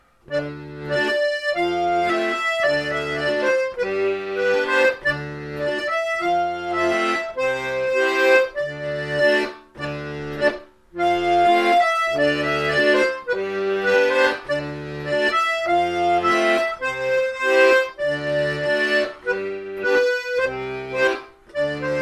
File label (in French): branle, Diato; laulhada